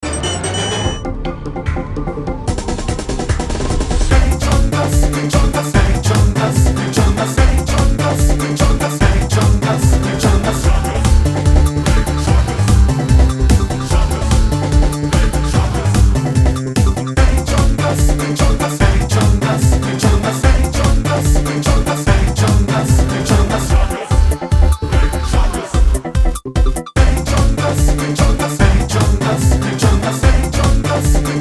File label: metal